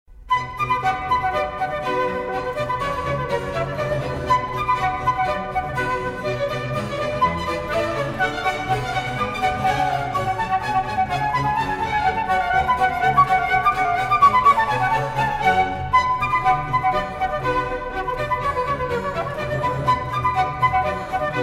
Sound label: Badinerie Orchestral Suite No2